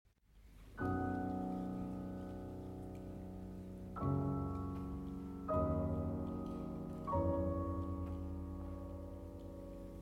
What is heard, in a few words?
Piano Trio No. 1 in B Major III. Adagio (Live); Op. 8; 1889 Version